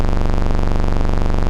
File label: glitch